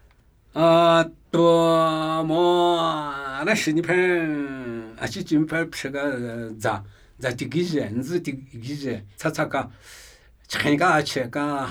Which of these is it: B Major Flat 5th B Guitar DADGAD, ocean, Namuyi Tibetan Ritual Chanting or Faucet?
Namuyi Tibetan Ritual Chanting